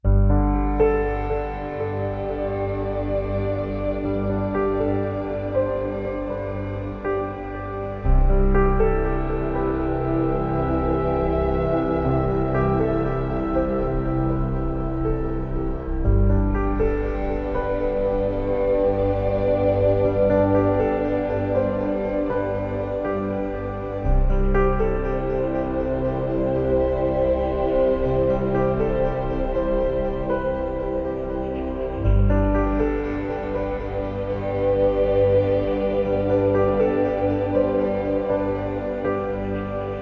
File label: Contentment Ambient